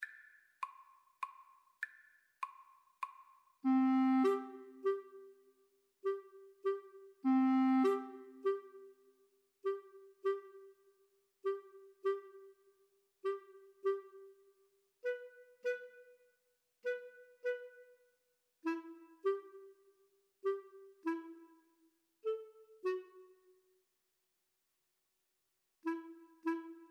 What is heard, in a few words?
elephant, Flute-Clarinet Duet